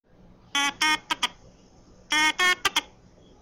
Mouse Droid Sing Song; Star Wars

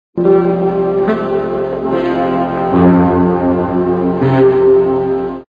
five notes mothership

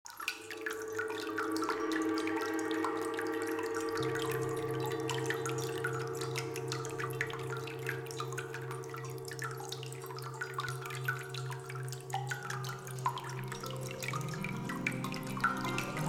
Meditation; Ambience

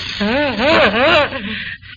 ahahahh; Three Stooges

